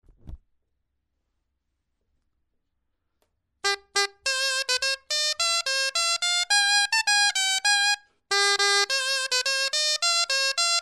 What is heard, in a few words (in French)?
clarin; Eths Caulets